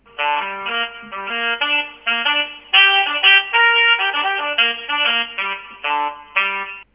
saxy; Alcatel OT-535; Alcatel OT-535 PC Suite

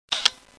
camera click; Alcatel OT 916D

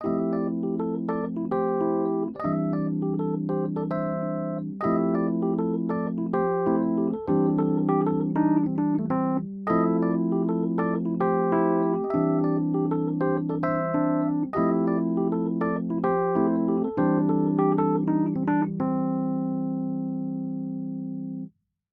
Morning Groove, alarms; Samsung; Galaxy E5

